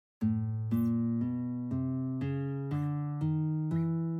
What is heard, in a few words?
Acoustic Classical